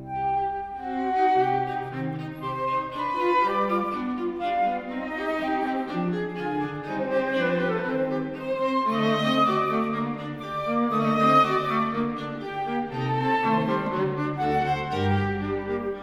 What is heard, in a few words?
flute quartet in C; Variation IV; Mozart; K.285b